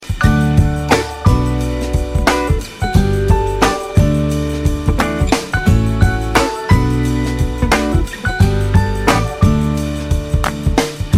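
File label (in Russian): horoshaya melodiya, good melody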